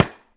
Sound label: Hit Spike; Civ4 warlords